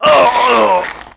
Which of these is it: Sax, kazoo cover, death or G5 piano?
death